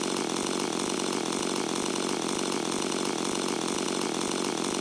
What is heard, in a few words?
hero chainsaw idle